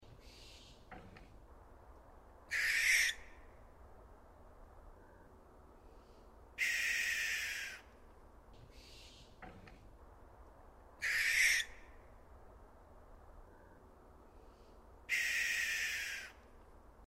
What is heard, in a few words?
barn owl sound